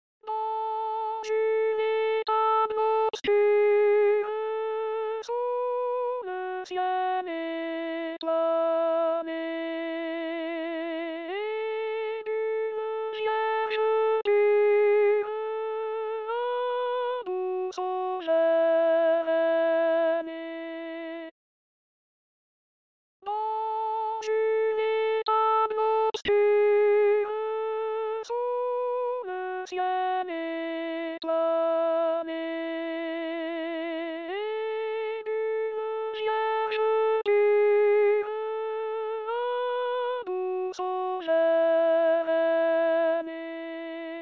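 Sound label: alto; praetorius; noel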